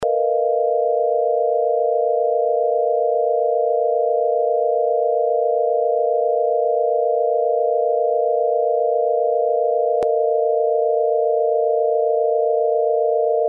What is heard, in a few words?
H balmer spectrum in sound